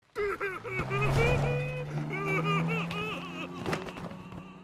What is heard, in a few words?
crying